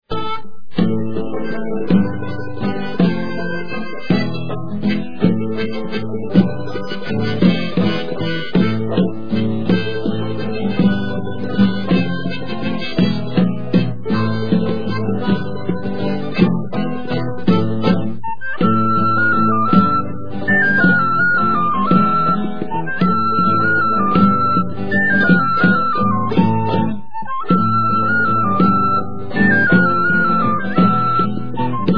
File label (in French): mazurka